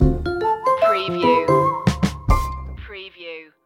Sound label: playful sunday stinger